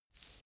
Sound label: cigarette sweep smooth